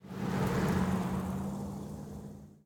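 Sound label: ambience pool; pork knox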